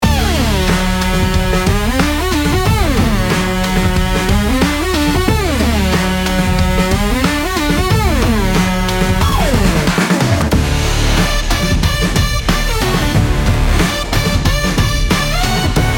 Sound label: 91 bpm Digital Crash, instrumental; 31 sec; ai social media music